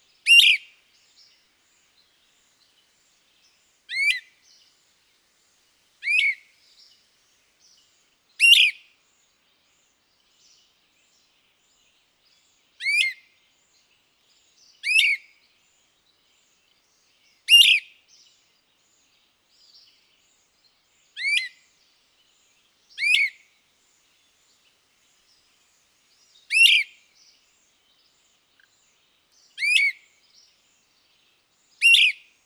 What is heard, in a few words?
great crested, Great crested Flycatcher; Great Crested Flycatchers prefer breeding territories in open broadleaf or mixed woodlands